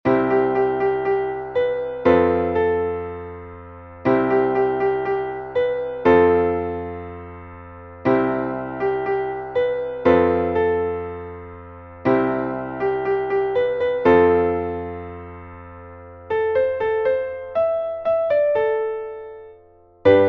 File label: Keyboard